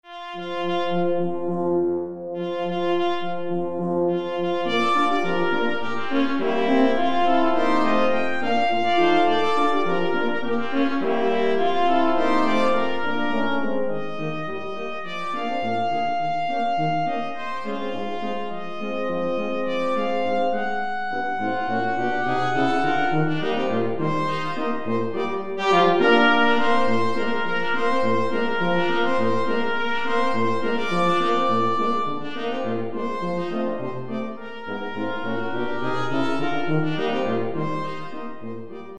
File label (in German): blechblasquintett